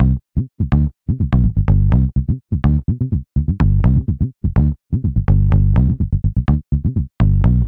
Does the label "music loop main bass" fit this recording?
Yes